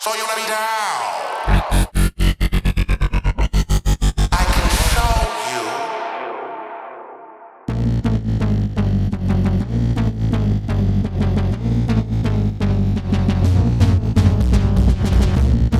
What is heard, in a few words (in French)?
Rythme Techno